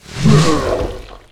throw baba; sprunki tree monster nexbot

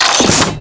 reload; cow mangler

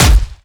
itemcrate smash common

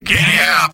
engineer battle cry; tf2; garrysmod; mvm

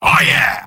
soldier positive vocalization; tf2; mvm

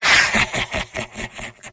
engineer laugh evil; tf2; garrysmod; mvm

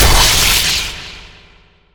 heavy sword impact; skbt hunter mutant; astro hunter; gods strength

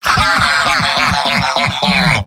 laugh happy; demoman; tf2; garrysmod; mvm

